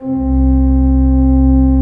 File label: C1, Cathedral Organ